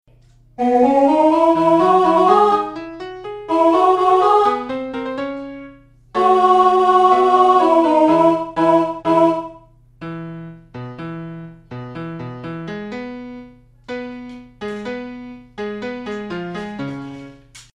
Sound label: mozart alto D; lyon